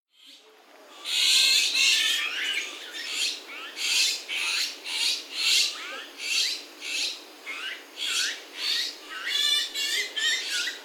オナガ, さいたま市

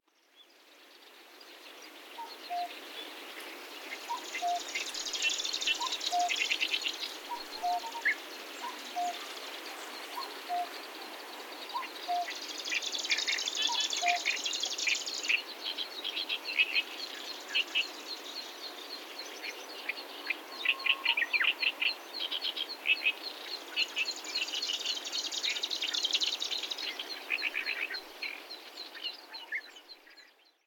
オオセッカ, 渡良瀬

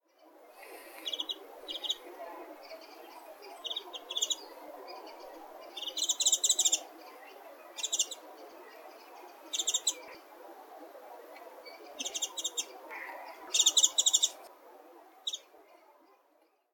mejiro

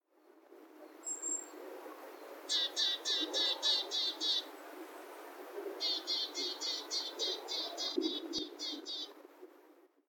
kogara; hayakawa

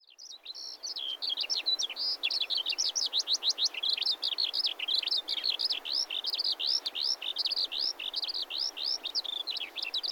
ヒバリ; さいたま市

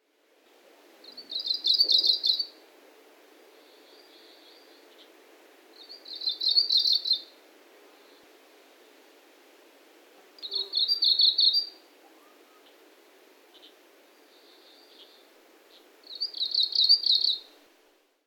mebosomushikui, 日光白根山

